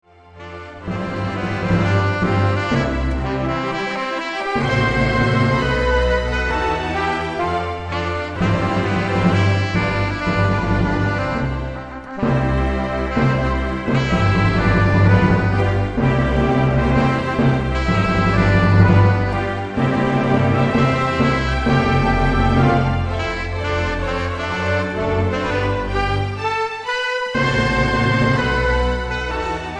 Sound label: wedding march sax; mendelsohn